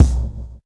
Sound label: BD, Bassdrums, Roland TD-20